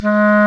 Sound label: Clarinet & Bass Clarinet